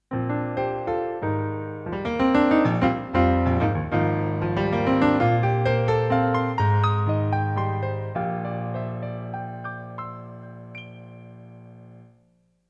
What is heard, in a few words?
GM Piano